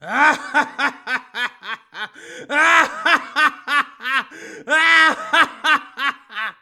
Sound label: Evil Laugh